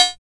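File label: cowbell, KR-55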